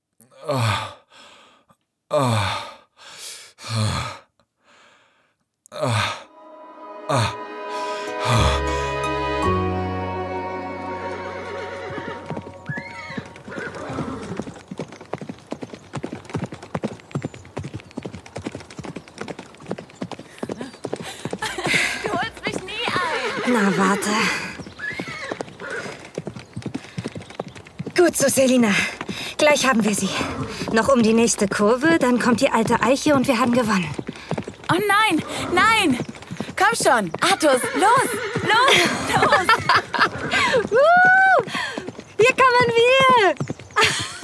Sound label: male moaning; Ein Ritter zum Pferdestehlen; Landlust zu dritt Das Geheimnis des Ritters (Sex Audios); Sex Audios Podcast